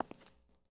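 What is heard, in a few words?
Foot Concrete Shoes Walk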